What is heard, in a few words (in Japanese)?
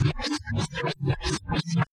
munasawagi; 胸騒ぎ２; 不安を煽るバックサウンドに ループ